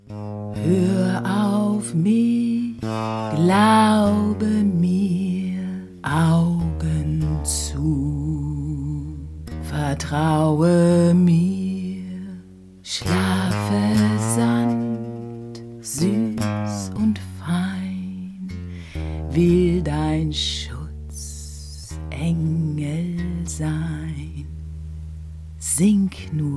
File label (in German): Gesang, frauen; BR2; Eine Verlustanzeige